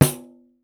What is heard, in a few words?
14 PANDE, PANDEIRO